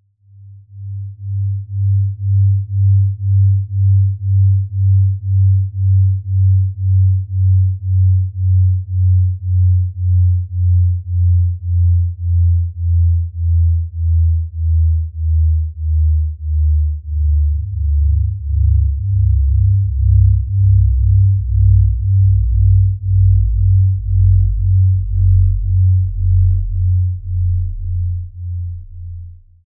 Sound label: Binaural Descending Tones